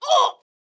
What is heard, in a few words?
woman death grunt; soundscrate